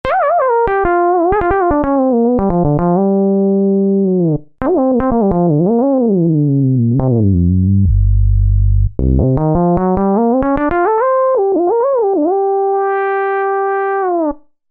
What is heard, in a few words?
Bendy Mini, Roland V-Synth; Factory Patches